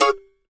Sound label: MANDO C# MAJ R; Sampleheads; Whole Lotta Country 1 & 2